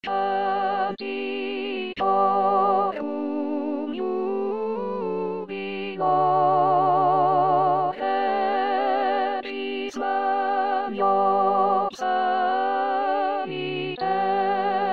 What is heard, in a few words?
Alto; Canticorum jubilo